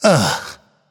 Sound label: nv ugh; cupid